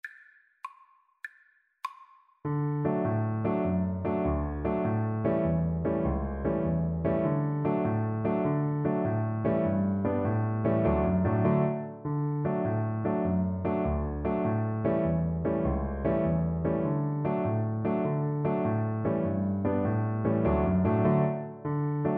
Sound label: new rigged ship, Viola